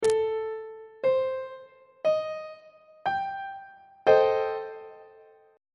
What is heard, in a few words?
d double flat minor 6th 3rd inversion on treble clef